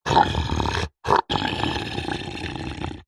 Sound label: horror monster growl frustrated